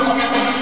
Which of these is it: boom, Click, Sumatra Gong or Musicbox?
boom